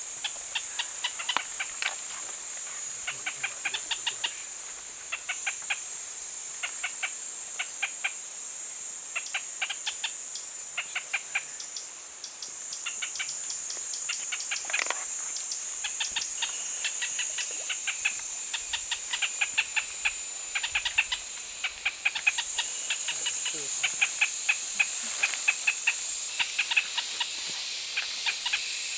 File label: Pinto's spinetail